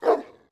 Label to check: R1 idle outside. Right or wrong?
Wrong